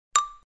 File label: XYLO; UISound